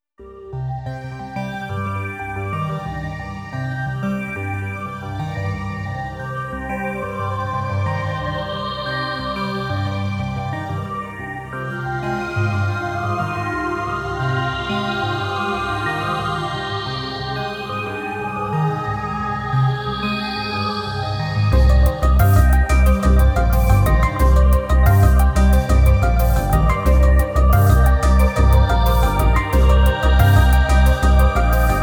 Hybrid Tech Theme 90bpm

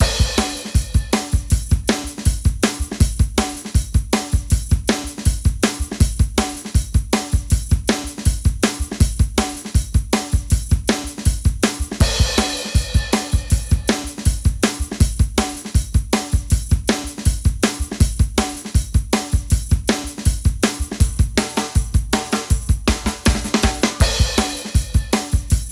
DRUMS

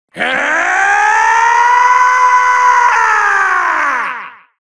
fight long; goku; TinyGame

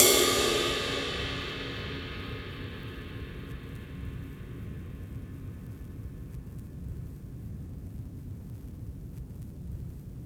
cymbal

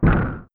place bomb; Bomberman

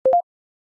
blip

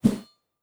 Sword Woosh; Hark the Moon Men Cometh; Weapons

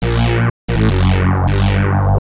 ghost appear; Bubble Bobble Remake

